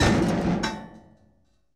Wood and Metal Collide

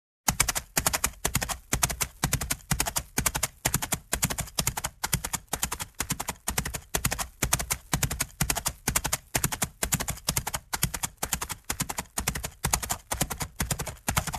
Horse Gaits on a sand surface

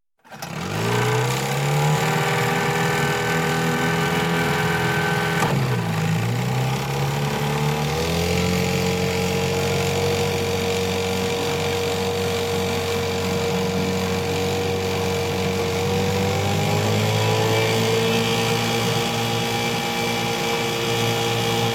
Riding motorized boat engine thrust

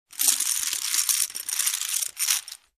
Poker Chips Stacking

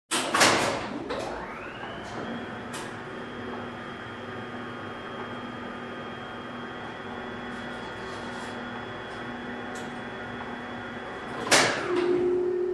Heavy Duty Machine turned on and off

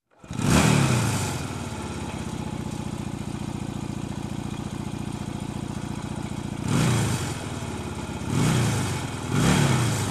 Offroad Motorbike revving up engine

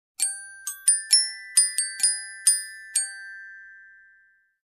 Muted mallet lullaby melody

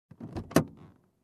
Opening compartment in car

